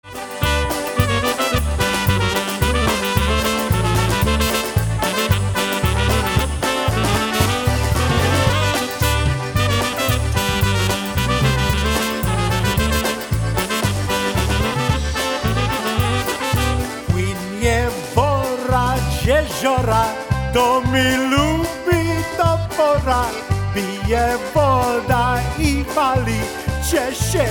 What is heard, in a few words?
Polka